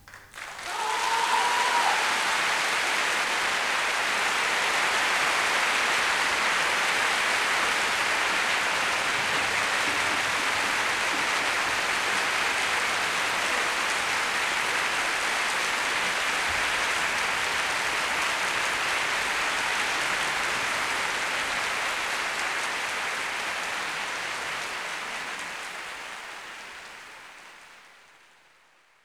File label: Applause